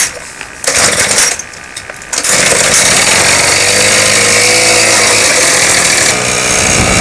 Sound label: motor start